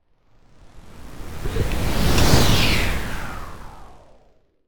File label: Car Riser